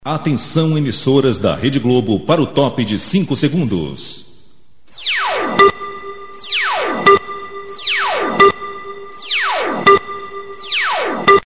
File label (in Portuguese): vinheta 5 segundos globo